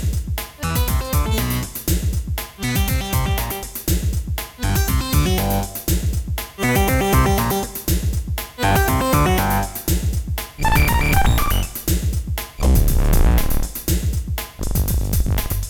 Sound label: electro Bass